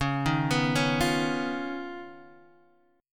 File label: D sharp 7th Suspended 2nd Sharp 5th